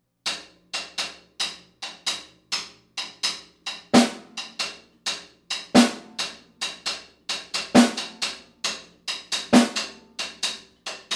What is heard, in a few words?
cascara accent